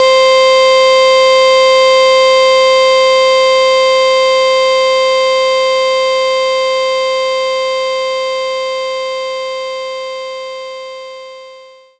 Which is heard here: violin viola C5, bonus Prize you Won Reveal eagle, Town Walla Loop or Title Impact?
violin viola C5